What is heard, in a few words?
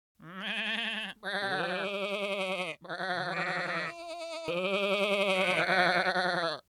sheep sounds; emerald-crossing